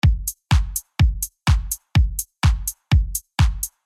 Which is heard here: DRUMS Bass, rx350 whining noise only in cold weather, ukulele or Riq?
DRUMS Bass